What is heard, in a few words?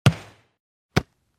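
udar i lovlia miacha, ball hit and catch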